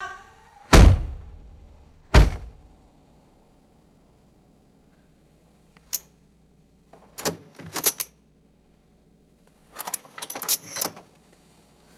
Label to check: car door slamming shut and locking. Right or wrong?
Right